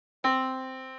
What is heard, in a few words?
yamaha Disk